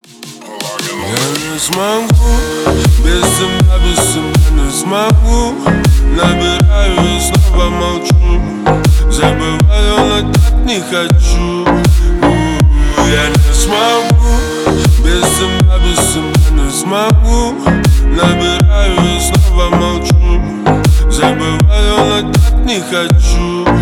remix

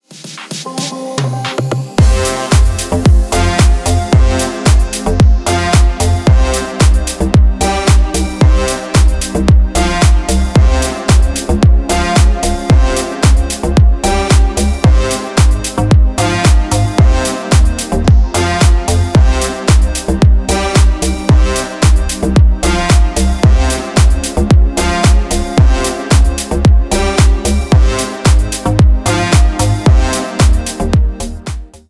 instrumental

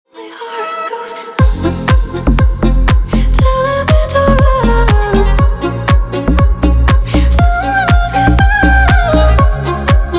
organ

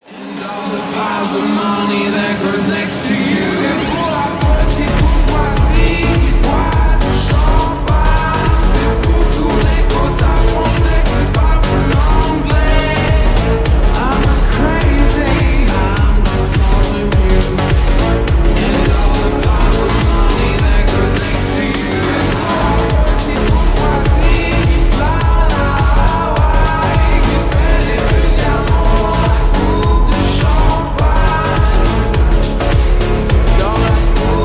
slowed reverb

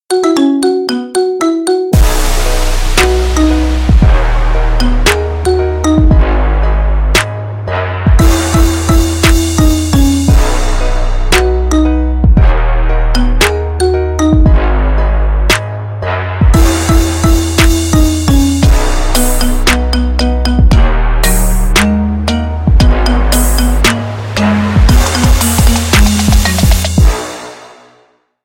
marimba remix

